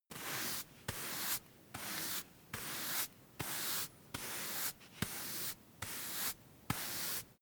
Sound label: broom hand sweep sequence